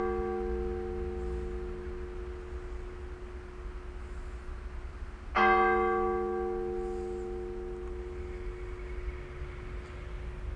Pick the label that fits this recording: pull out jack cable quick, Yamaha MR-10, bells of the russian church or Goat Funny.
bells of the russian church